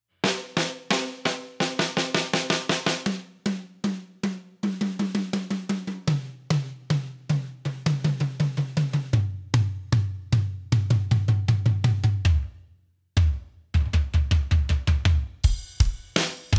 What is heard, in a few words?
Sonor Drums Rock EQ comp